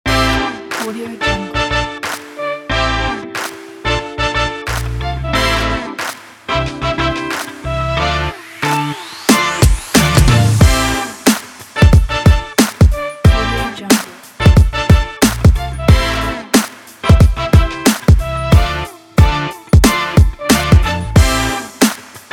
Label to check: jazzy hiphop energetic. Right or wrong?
Right